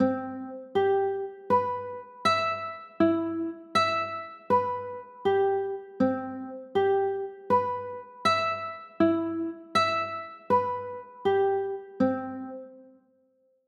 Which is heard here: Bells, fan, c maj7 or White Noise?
c maj7